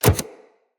companion step default, footsteps; Pets; Zanuka

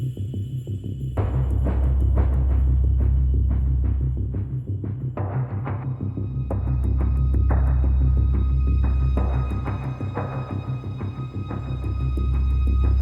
Merchant Menus Loop; Warframe; Deimos

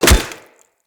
Melee Staff Impact Flesh

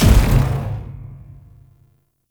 Boost Gravity Well End; Warframe; Nineteeen Ninety Nine; PvPvE

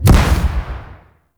Grenade Explosion; Warframe; The New War; Kahl; Abilities

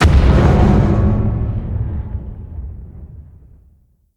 Ship Damage Explosion; Vehicles; RailJack; RailJack Hit Effects

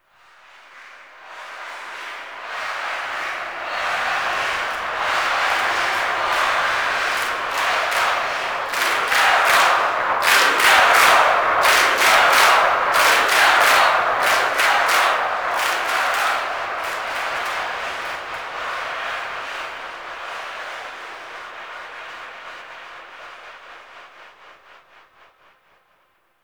Lunaro Echoes; Ambience; Warframe; TheNewWar; Zariman

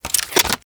Briefcase Akimbo Pistol Unequip; Warframe; Corpus

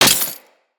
Melee Impact Glass

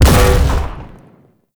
Void Dragon BFG Beam Shot; Enemies; Abilities; Warframe; Duviri

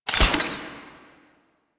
Exalted Sniper Alt Fire Mech React; Warframe; Frumentarius

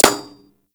Bullet Impact Metal; Warframe; Weapons; Bullet Impacts

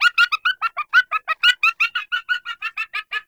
Forest Animal Laugh; Warframe; Grineer Forest

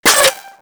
Scope Gun Mech; Warframe; Weapons; Corpus

